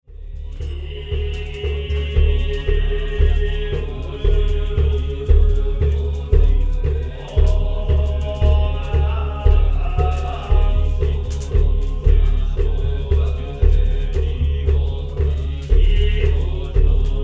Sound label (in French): extrait chant bouddhiste du mont shigi